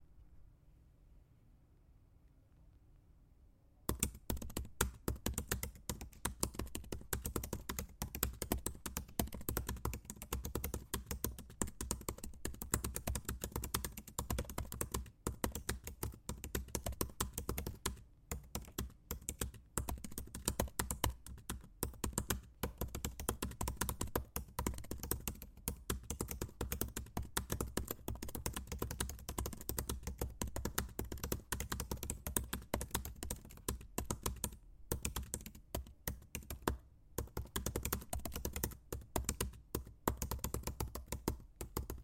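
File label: fast typing on keyboard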